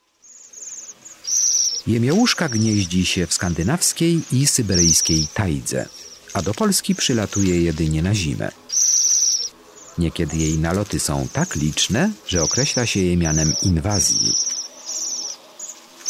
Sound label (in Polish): JEMIOŁUSZKA, Ptaki; Kurs PTTK